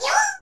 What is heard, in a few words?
Unknown Jingle; SNES; Zan 3 Spirits